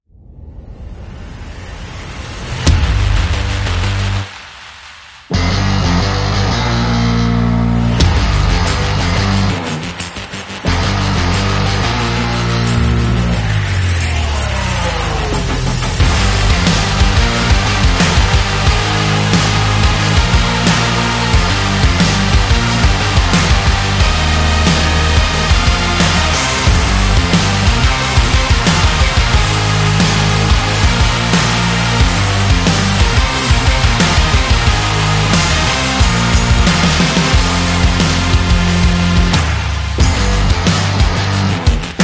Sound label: Modern Sport Rock; active rock music